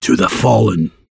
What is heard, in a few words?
Bar Cheering when drinking